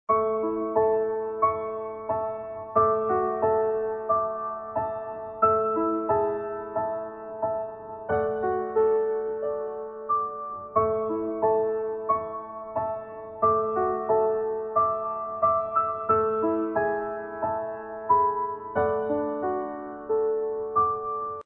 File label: Happy Uplifting Sweet Piano Loop